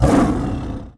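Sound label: bear attack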